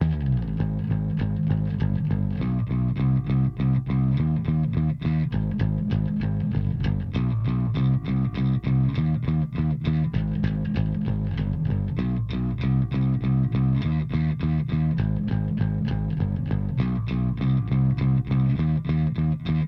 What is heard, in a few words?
Bass Line Amp No Fix